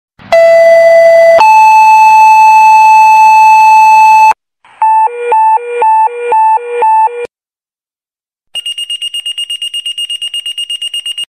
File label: structure fire tones